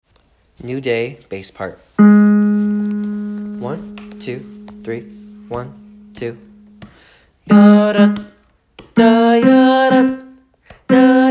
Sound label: bass; SCVA Vocal Jazz Contemporary A Cappella Honor Choir